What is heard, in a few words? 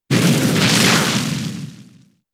Fire Spin; Pokemon; gen 7; SUMO; USUM